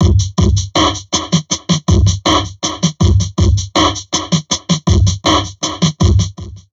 Drum Loops Crazy Processed

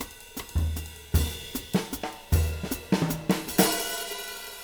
310 BEBOP, Living Drums

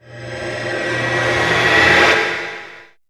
Ambient Atmos, Dance Synths

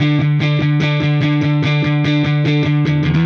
Silos Dirty Strum Guitar, Indie Rock

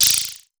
Prophet Five; TWITTER; E-MU Formula 4000 Series Vol. 3 – Analog Odyssey

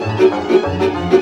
VIOLA GROOVE; Best Service; World Colours

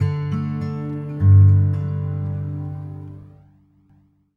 Sure, Acoustic String; Sonic Foundry (Sony Creative Software); Friendly Fire

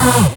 Synths H; Vengeance - Essential Clubsounds Vol.2

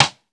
Linn 9000 stick; Akai MPC5000

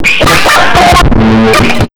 FX, Big Beat Drumloops; PHASE 130; USB Soundscan vol.25; AKAI

